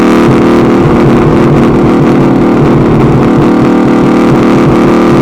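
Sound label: cobra427 off mid,car engine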